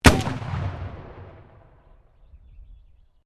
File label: Canon Field Gun 25 Pound 3.5 Inch Breech Loader Firing, Black Powder firearms; 1880; Black Powder firearms at a shooting range; Gun Shots